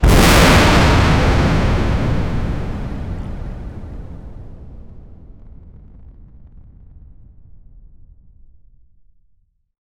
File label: surface explosion shockwa; reflections